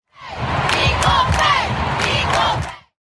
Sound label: chant equal pay